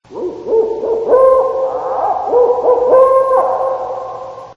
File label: Magic House owl